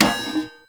Street Sign Destroy; Ambience; Props; Warframe; Nineteen Ninety Nine

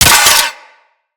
Hand Rocket Launcher Mech; Weapons; Corpus; Crp Hand Rocket Launcher

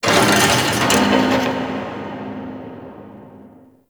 Turret Moves; Warframe; Grineer; Fortress; Ambience

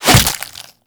Barb Hit Flesh; Warframe; Desert Skate; Hit Effects

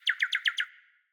Cardinal Male Bird Song

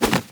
Arm Swing Foley; Warframe; Purgatory Warrior

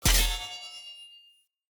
Short Sword Medium Parry Perfect Block Impact; Weapon Impacts; Player; Swords; Combat

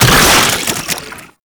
Infested Fishing Fish Kill; Spear; Weapons

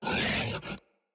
Kubrow Feral Vocal Melee Attack

